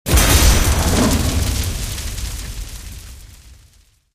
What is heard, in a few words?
scatter Hit; netent